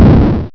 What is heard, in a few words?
damage; glitch in the system